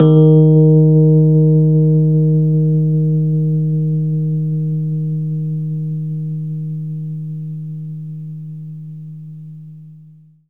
Rhodes, D#; 3D Sound; 3D Keys VOL-1

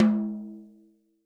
TOM, Real Drum Kit

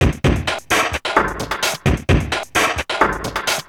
Beat, Industrial

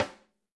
Ludwig Snare

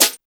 DB SNARE, JUNGLE KIT; Drums In Your FACE!

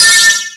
Found Sound, Drum Machines

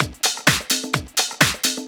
Latin Loop 128 BPM

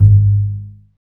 STR, STRINGS; Roland - LA Composer vol. 1

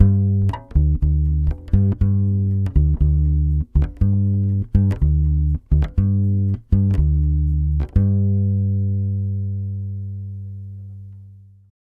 120 BASS G, Brazil Bossa; USB Soundscan; AKAI

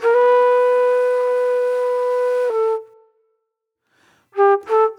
Pop Flute

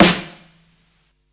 Snare, Psychedelic Drums

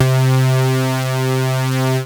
MOOG BASS C4; Best Service Dream Experience

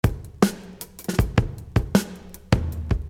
Beat, Hip-Hop Street Beats; Construction Kit; Sonic Foundry (Sony Creative Software)

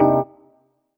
CHORDS; EastWest; Dance Mega Hip Hop Electro

